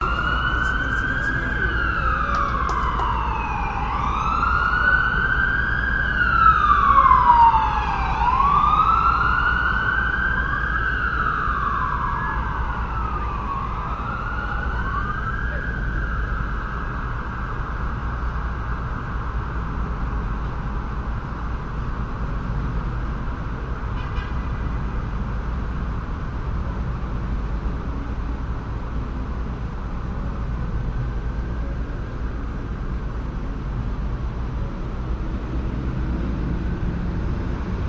vancouver night traffic waterfront distant sirens rumbling roaring traffic cars; engines driving; your free sounds